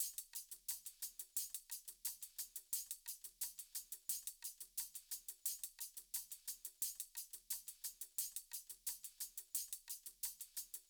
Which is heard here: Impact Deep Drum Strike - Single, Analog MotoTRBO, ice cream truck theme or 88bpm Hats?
88bpm Hats